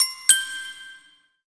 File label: Complete